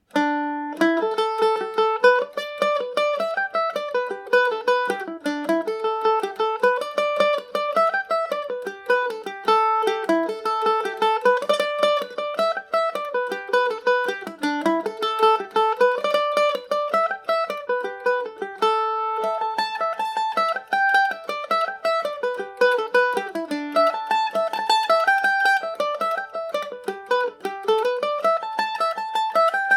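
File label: A dorian, irish mandolin; fairhaired boy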